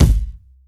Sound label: mid range kick sound a key, Royality free kick sound tuned to the A note; kick drum samples